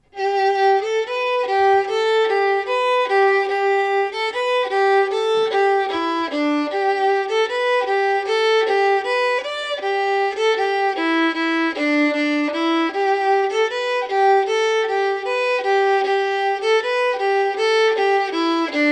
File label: polka slow